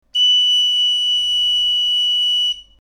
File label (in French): sol, galoubet; Note Sol - au-dessus de la 5éme ligne